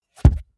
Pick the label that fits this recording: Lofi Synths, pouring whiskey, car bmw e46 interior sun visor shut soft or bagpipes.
car bmw e46 interior sun visor shut soft